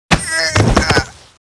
ArcWright dead

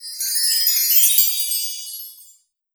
Ice Shard Wind Shard

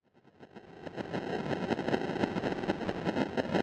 Retro-Game Drawbridge-Creak; Maze

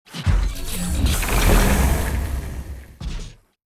Poison Shower

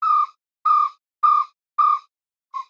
Amb Env Bird-Coo; Sx Amb 3-Botanist

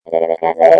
Moonface Voice

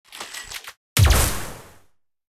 Light Pulse; starbites

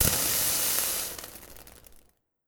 Kamikaze Fuse; Legend of Keepers

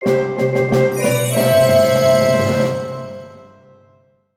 Level Up; PVP

